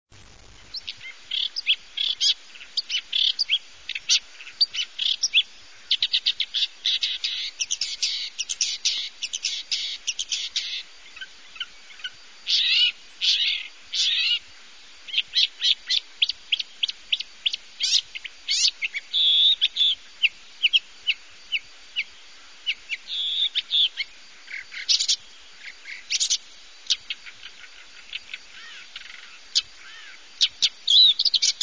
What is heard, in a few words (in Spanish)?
canto alcaudon comun; aves campo